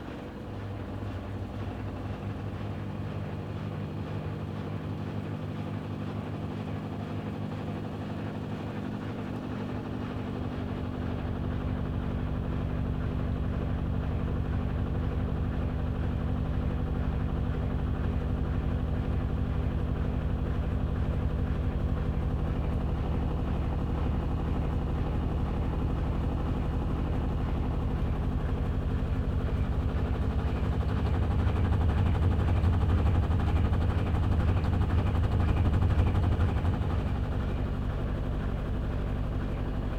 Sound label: Machine Running Large Loud Machine Buzz